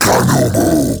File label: Cast Vocal; Warframe; Uriel; Demonium